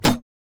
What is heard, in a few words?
vs metal; LoL; sion